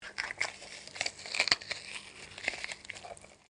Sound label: Rend organic tear, Created organic sounds; Blood and Guts; Horror